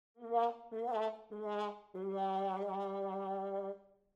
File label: Sad Trombone; RODECaster PADS; UNIFY